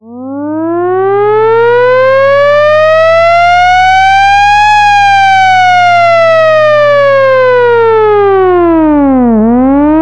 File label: air raid mechanical siren